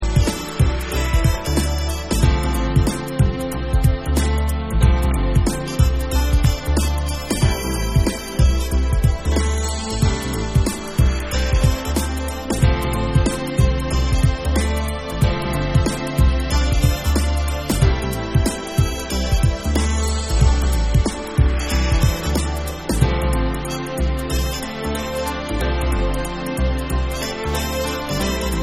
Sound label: Horn Instrumental Mix